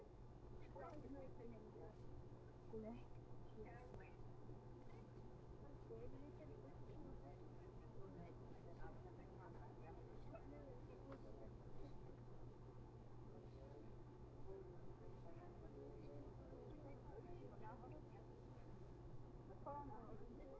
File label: background